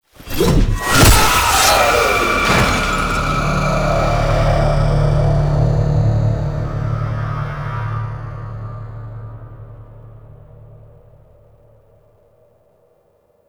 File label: Infested Swarm Finisher Stinger; gameplay; Warframe; Entrati Labs